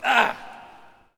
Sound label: Hockey Male Hurt; SFX Kit; Hockey Vocal Sounds